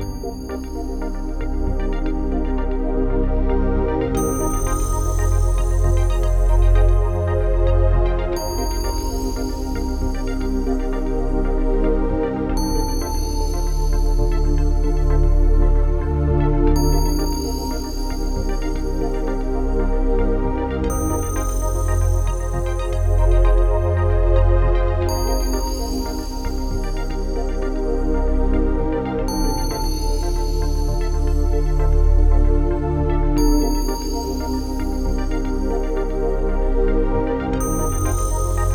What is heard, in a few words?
Puzzle Game, fantasy